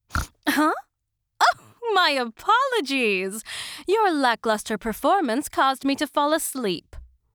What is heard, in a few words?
Death; Yukari; Phantastical Boundaries